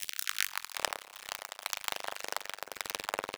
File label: loop, techno atmos